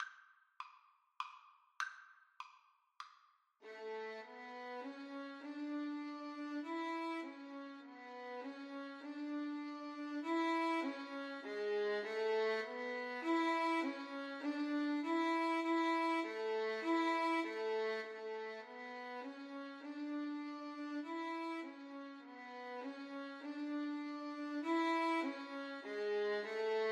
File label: Violin duet